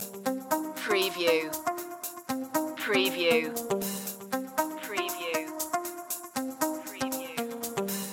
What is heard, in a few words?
city blues loop